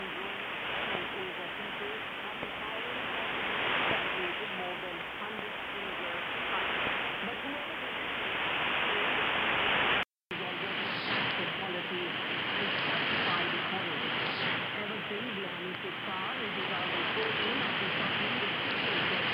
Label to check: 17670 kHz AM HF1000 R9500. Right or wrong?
Right